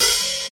90s quiet pop folk open hat sample e key, Royality free open hi hat tuned to the E